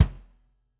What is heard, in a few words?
Kick, drum